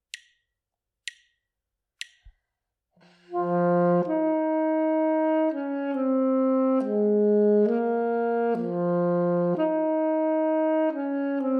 melodic dictation

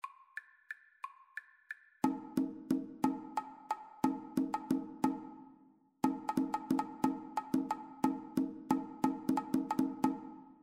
two part rhythmic dictation; ear training

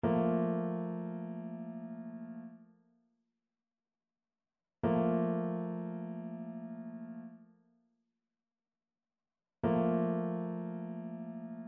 trichord; trichord identification

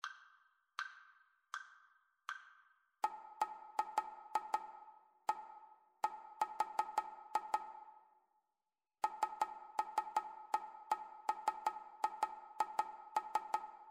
rhythmic dictation

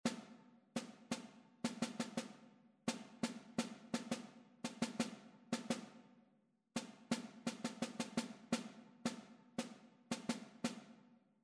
rhythmic error detection

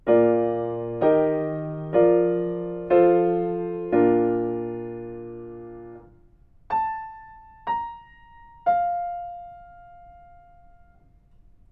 melodic fragments

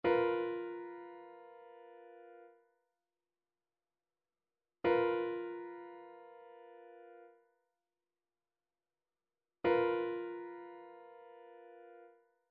trichord; trichord identification

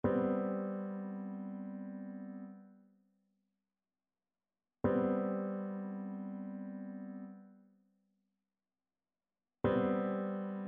trichord; trichord identification

